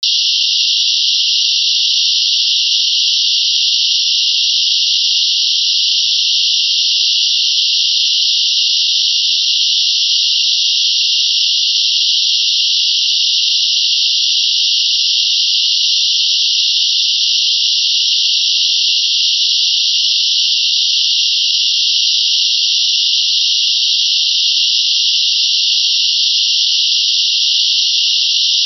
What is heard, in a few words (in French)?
bruit rose